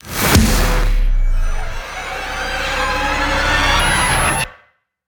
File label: combat 176bpm; Doom; GLORY